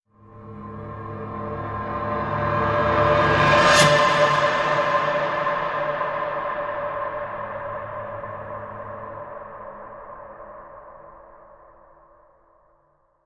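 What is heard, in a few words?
Reverse Very Scary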